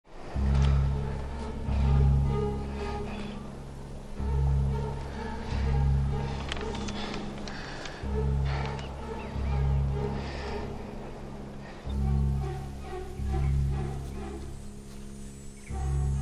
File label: More Suspense